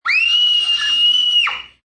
Scream Hi Shrill, Scream, 1 child, classic, long, high-pitched, human voice, kid; Screaming (Sound Effects:Voice)